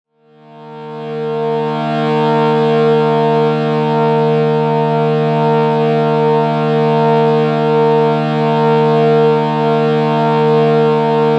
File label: Shruti Box D# A# 432Hz